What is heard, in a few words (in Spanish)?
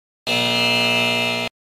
Efecto de sonido Error